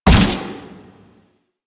Layer Shot, Quill Dart Gun; Hystrix Prime

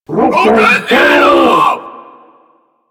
Infested Jetpack Attack; Warframe

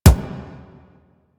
Weapon Punch Pistol; Warframe; Weapons; Pistols

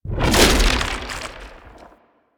Arm Turret Throw; Warframe; Man In The Wall

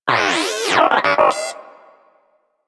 Moa Inquisitive Vocal Enemy Spotted; Warframe

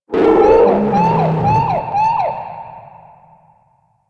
Bomber Strafe Run Warning; Warframe; Eidolon; Grn Bomber

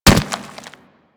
Infested Runner Step Default; Warframe

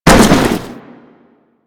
Fall; Warframe; Tenno; Foley

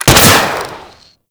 Skiff Missile Fire; Grn Skiff Missile

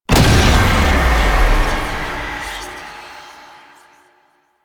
Apparition Impact; Warframe; Dagath

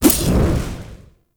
Sticky Bomb Throw; Grineer; Veilbreaker

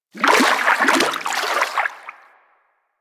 Fishing Spear Fish Spook; Warframe; Weapons; Eidolon Fishing Spears

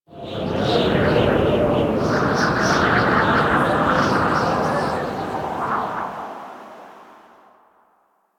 Exterior Wind Gust; Warframe; Ambience; Duviri

